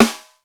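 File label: PICCOLO snare drum; East West; Global Sounds; R8M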